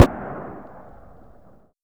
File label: wormhole sound burst far